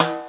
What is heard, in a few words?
pan; My Science Fair